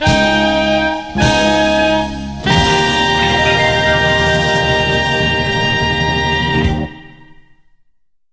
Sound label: game show horns